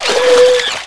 flipper pain; lmctf

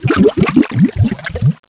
DROWN; openffa; qbot

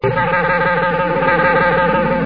engineering

